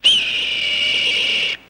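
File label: Hawk Long Screech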